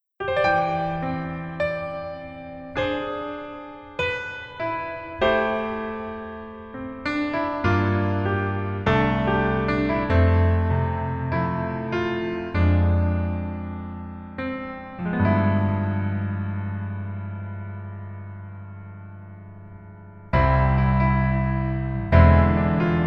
Piano ver 멜로디 MR -1 키

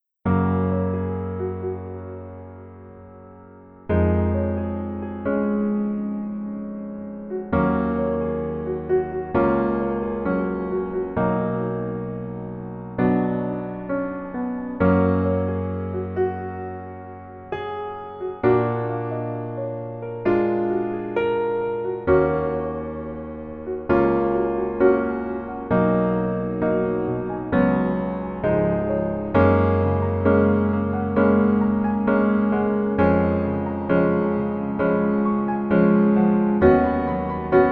Piano ver 멜로디MR

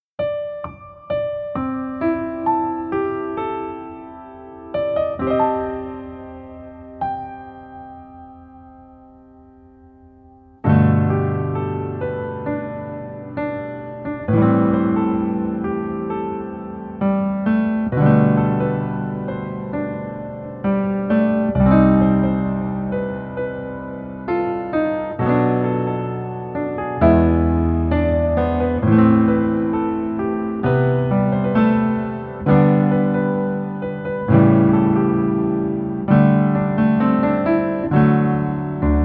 Piano ver 멜로디 MR 남자 키

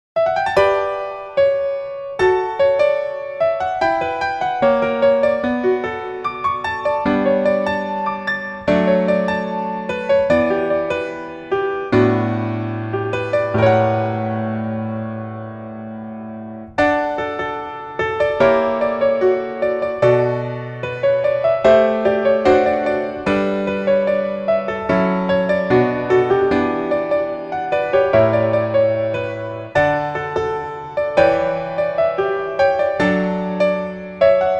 Piano ver, 멜로디MR

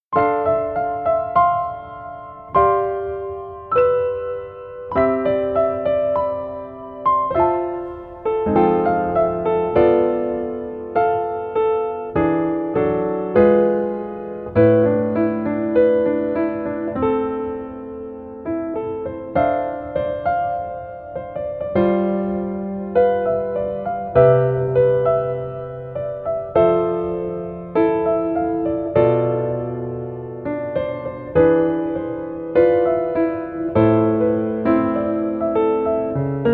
Piano ver 멜로디 MR 여자 키

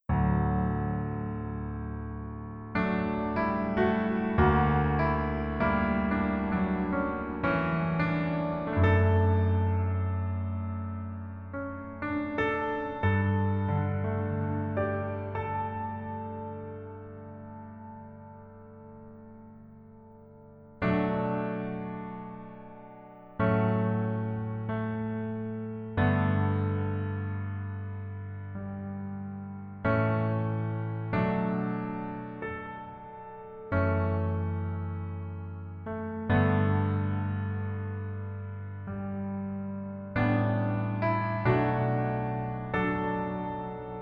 Piano ver, -1키

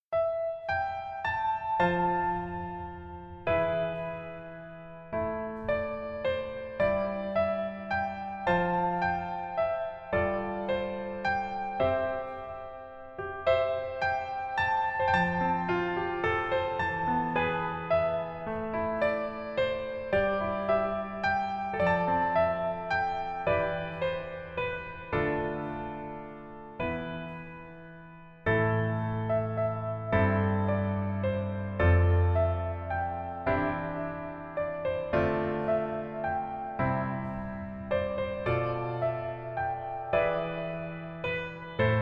Piano ver 멜로디 MR 여자 키

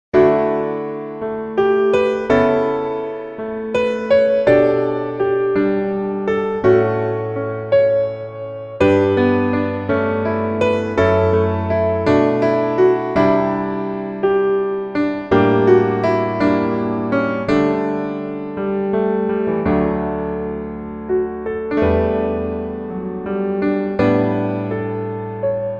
Piano MR 멜로디MR

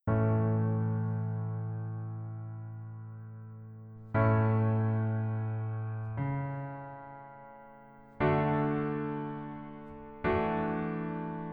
Piano ver -1 키

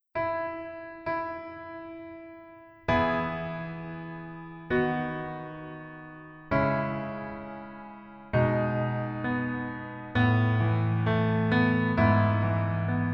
Piano ver, -1키

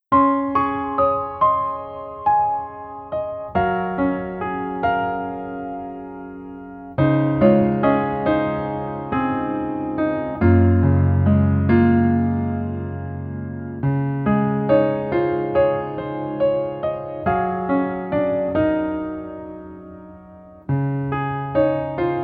Piano ver -1키

